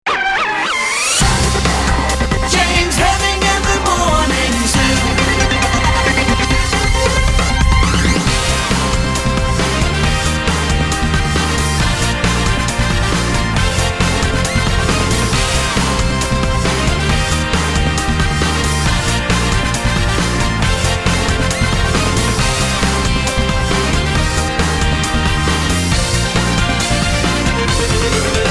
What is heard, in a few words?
zoo jingle